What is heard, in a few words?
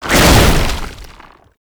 Tank Infested Charge Launch; Enemies; Abilities; Warframe; Lasrian Tank